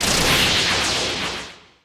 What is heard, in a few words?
Spread Ball